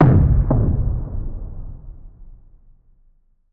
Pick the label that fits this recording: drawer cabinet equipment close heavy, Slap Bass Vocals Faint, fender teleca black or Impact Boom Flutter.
Impact Boom Flutter